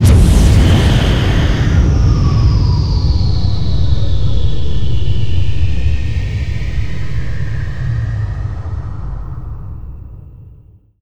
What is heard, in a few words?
Car Fly; Nicktoons Nitro; arcade